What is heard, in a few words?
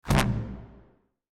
weapon picked